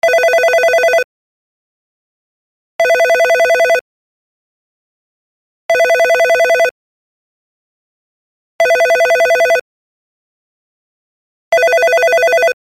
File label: classic bell